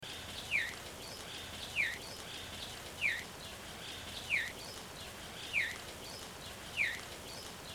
canto do sabia norte americano

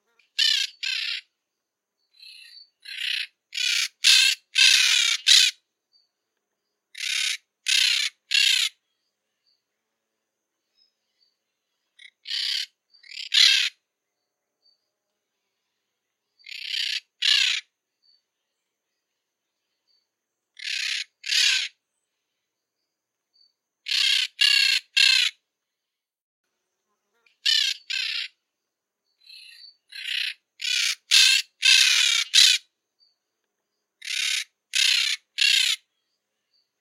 canto do periquito estrela

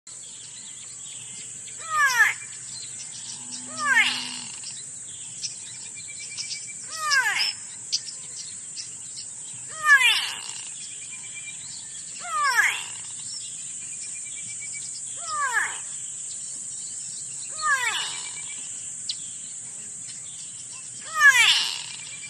Canto do turaco unicolor

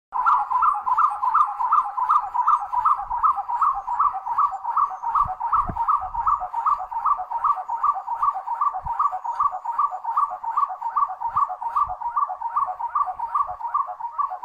canto do uru corcovado

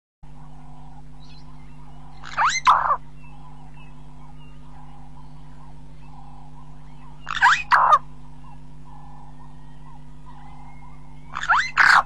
canto do estorninho de burchell

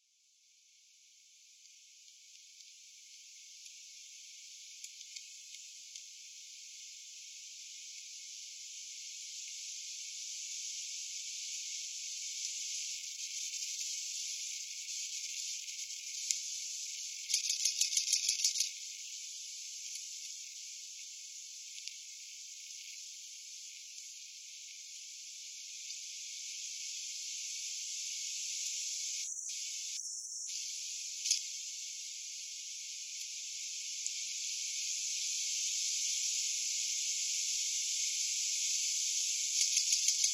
Canto do corococho

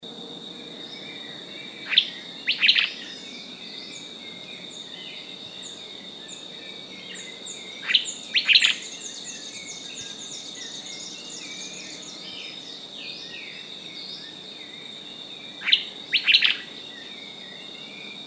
canto do tuque

